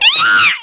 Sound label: ooff; Usada Pekora